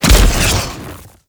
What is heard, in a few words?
Bow Arrow Rain Explosion A; Ultimate Abilities; Madurai; Warframe; Focus